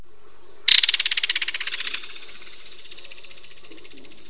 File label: belted kingfisher